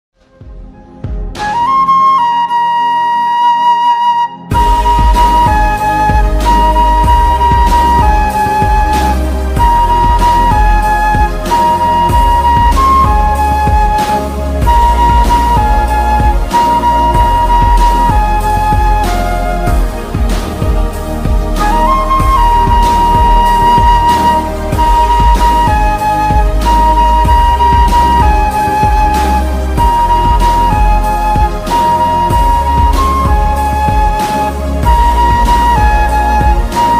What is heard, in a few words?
flute instrumental ringtone